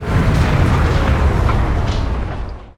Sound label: Upper Elevator Stop